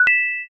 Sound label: notify like